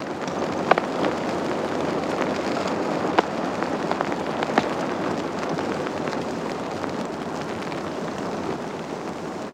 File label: sfx, FX Atmos; Best Service ProSamples vol.36; Chillout